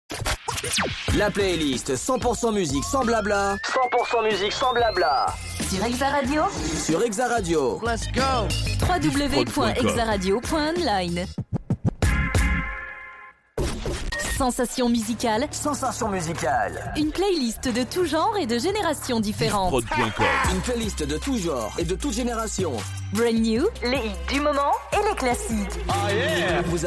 Radio Jingles; Hexa

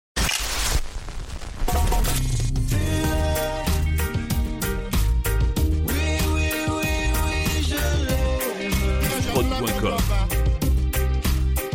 Medley Reggae Urban FR

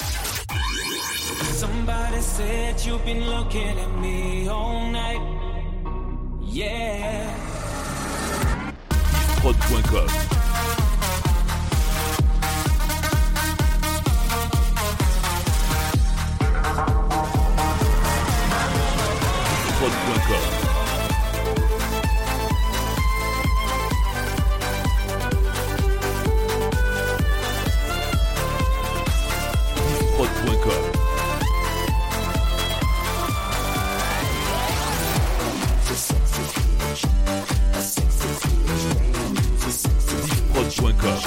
Medley Dance 2010s

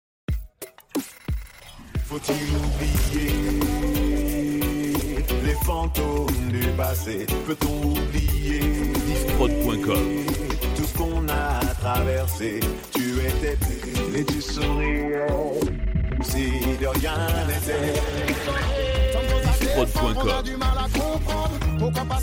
Medley Urban Reggae

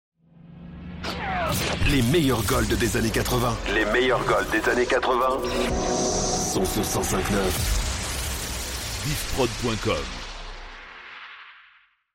Sweep Golds 80

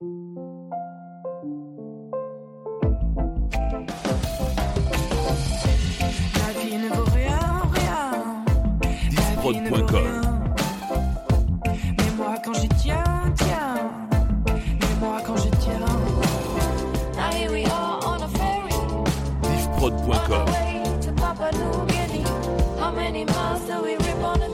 Medley Soft 85 BPM

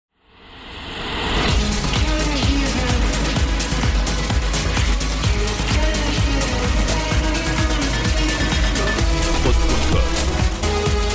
Medley Electro 2010 IDM

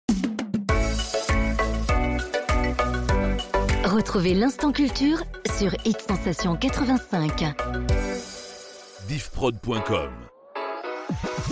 Jingles; Hit Sensation 85

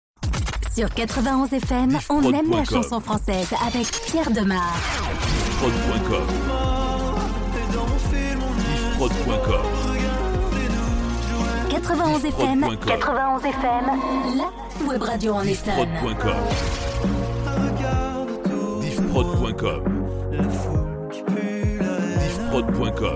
Power intro; 91 FM

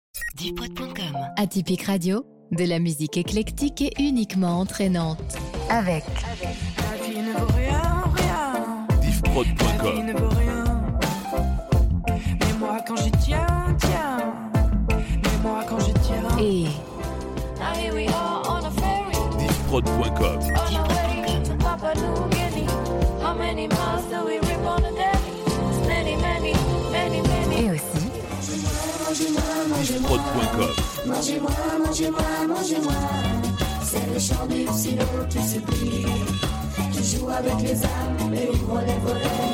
Radio Medley Pop Rock

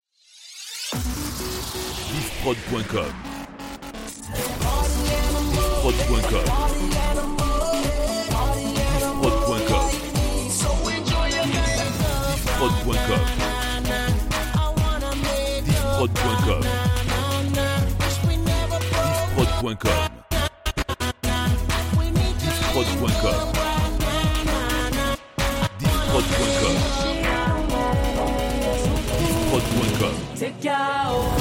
Medley Retro 2000s 130 Bpm

